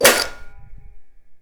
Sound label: attack hit; Rust